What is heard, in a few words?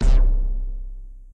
gameover; flying ball